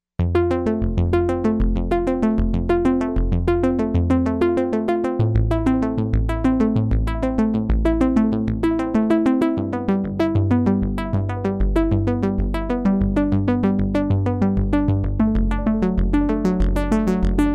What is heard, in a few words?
Useful Arpeggiator